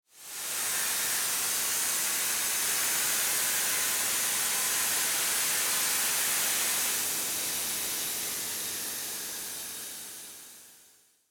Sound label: Event smoke machine fogger running; machinery